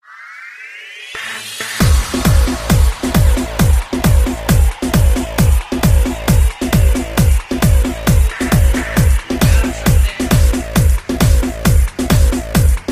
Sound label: hard music